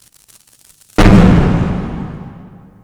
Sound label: FX HIT, Techno Trance; Ueberschall